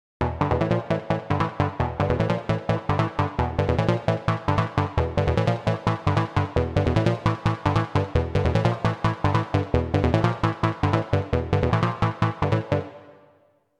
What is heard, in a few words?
Blip